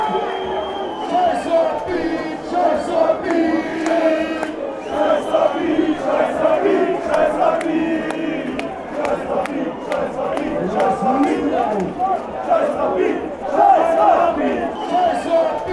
sheiss rapid, fanchants

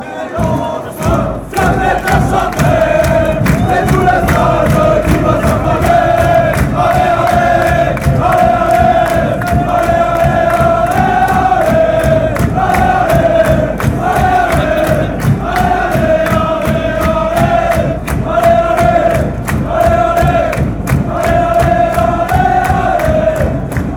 singing, fanchants

